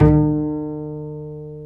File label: Bass, Roland; Club-50 - Foundations Roland; Ac Bass